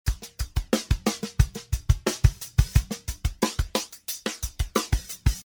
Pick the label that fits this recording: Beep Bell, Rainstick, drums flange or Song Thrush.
drums flange